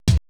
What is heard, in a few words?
80S DRUM SET